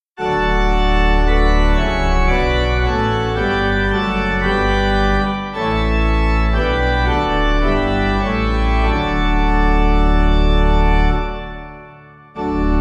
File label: Hymn Pipe; Christ When For Us; Caithness; Hymnal 1982